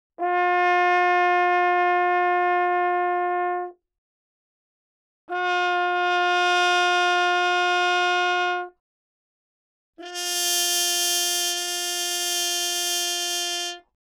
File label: Horn; 気鳴楽器; LipReed